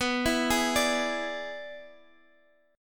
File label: E 7th B, guitar